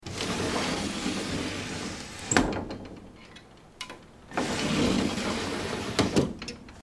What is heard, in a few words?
sliding door